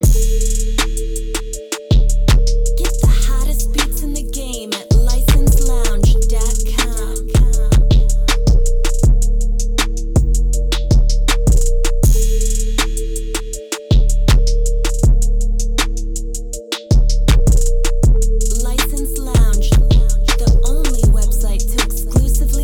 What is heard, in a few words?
BEAT SNIPPET